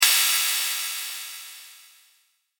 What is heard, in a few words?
crash